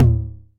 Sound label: Tom Mid 909; Drum Machines; Drum Hits